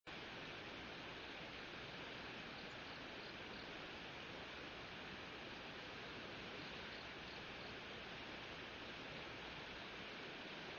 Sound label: riverside